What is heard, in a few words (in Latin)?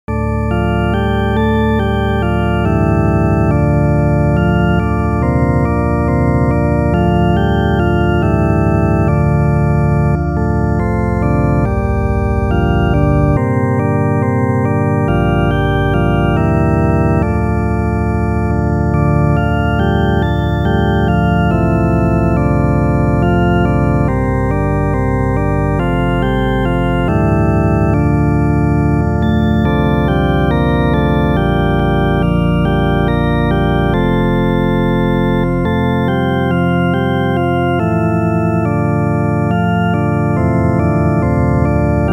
solo org kyrie, organo